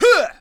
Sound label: Monster Human type Attack